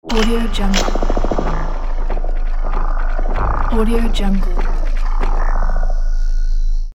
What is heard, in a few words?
energy shield